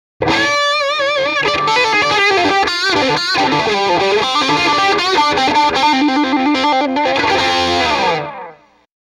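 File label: m75 lp bridge pickup dist jtm45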